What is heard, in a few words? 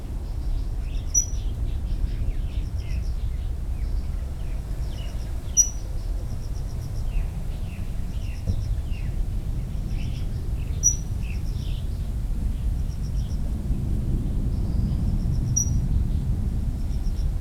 warbler pale legged leaf Phylloscopus tenellipes